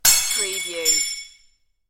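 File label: Plate Break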